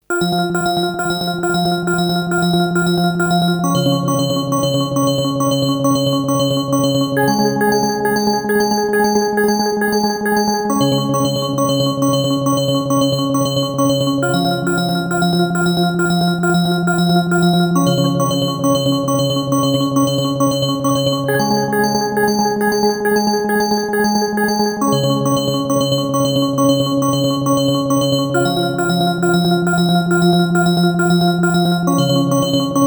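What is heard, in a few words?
FM Bass Chords Dry to Wet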